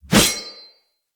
Sword Swipe, Whooshes; Weapons; Melee

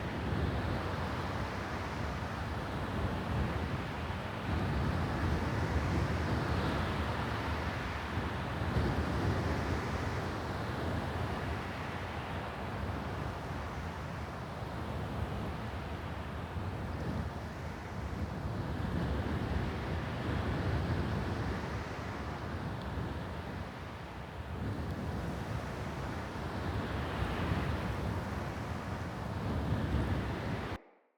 Prisoner Loop Ambient Wind; New Player Login Sequence

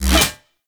Venus Fishing Spear Line Retract Catch; Warframe

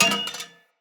Hunter Bow Drop; Weapons; Enemy; Bows

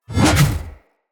Spear Spin Whoosh; Weapons; Enemy; Nimrod Spear

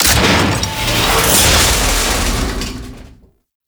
Outpost Prisoner Cage Open; Warframe; The New War; Narmer Outpost

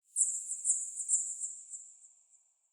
Bat Chatter Distant, Ambience; UnderCity

